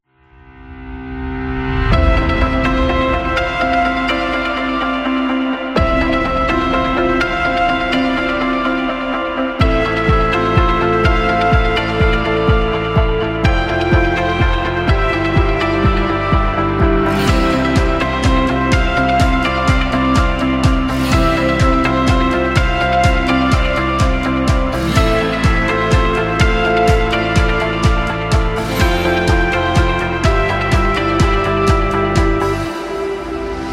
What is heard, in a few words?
Inspiring Rock; cooking show